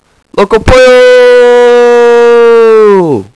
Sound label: voice battlecry